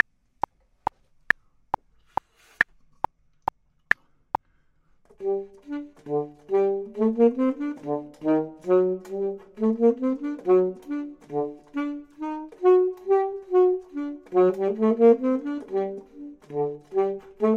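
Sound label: bottom, Saxophone and Clarinet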